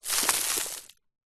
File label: foley dirt fine dust handful drop sprinkle ground